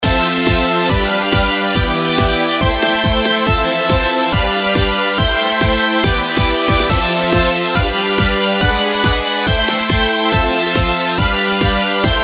シンセ音作りサンプル素PAD

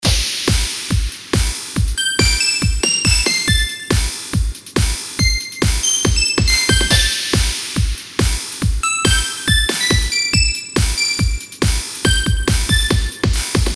シンセ音作りサンプル 高音四角波

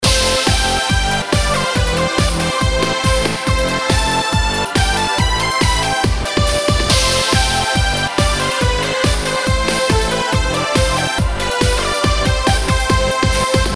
シンセ 音作り サンプル PAD あり

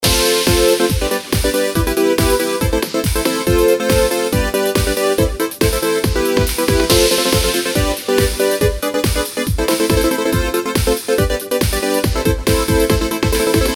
シンセ音作りサンプル attack pad トランスゲート

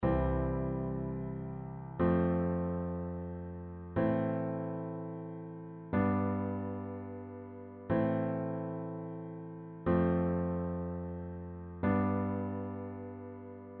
ダイアトニックのみコード進行

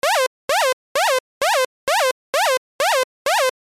ノコギリ波 4分打ち AD設定

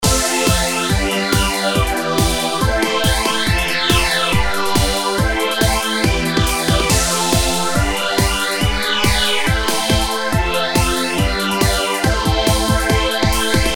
シンセ 音作り サンプル 素 PAD フェーザー有

